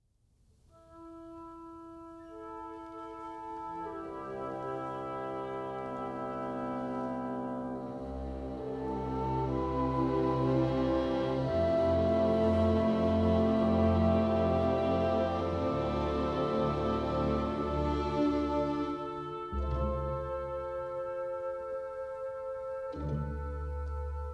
Orchestra; butler philarmonic

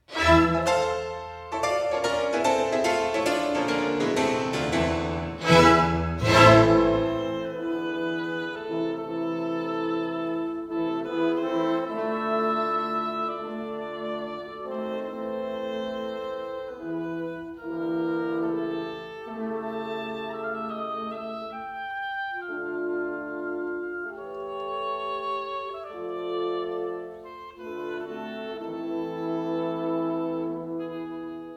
Piano Concerto; Schumann Piano Concerto Op.54 1st Mov; butler philarmonic

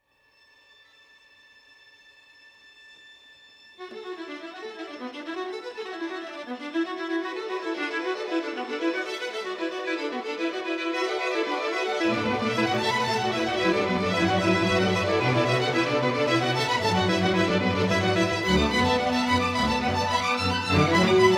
Strings; bohuslav orchestra

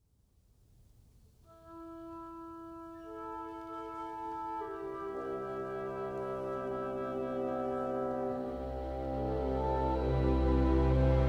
Orchestra; butler philarmonic

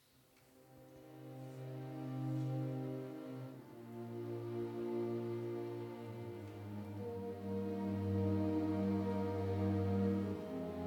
Piano Concerto No.2, Orchestra; Rachmaninoff; op.18 2nd mov; paducah symphony orchestra

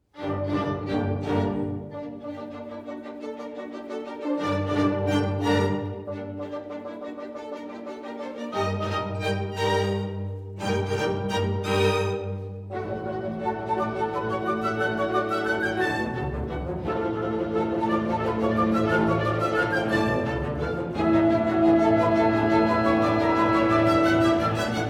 Piano concerto in Dm 3rd mov; Mendelssohn; orchestra manhattan

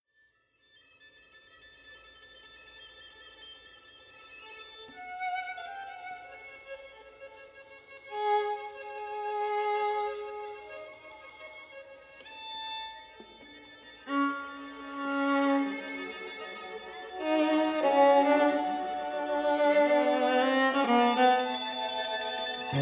String Quartet

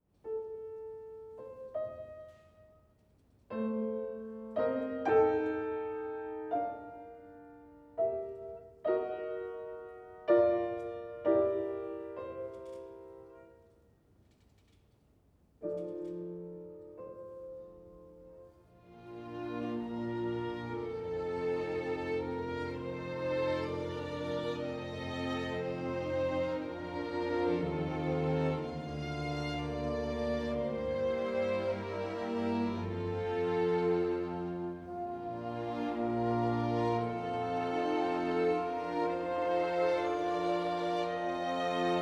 Piano concerto in Dm 2nd mov; Mendelssohn; orchestra manhattan

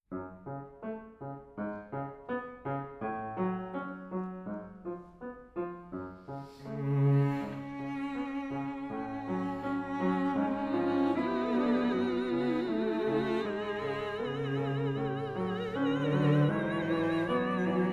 Lullaby

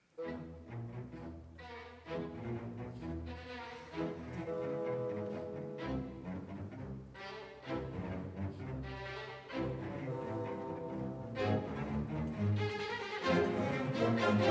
Piano Concerto No.2, Orchestra; Rachmaninoff; op.18 3rd mov; paducah symphony orchestra

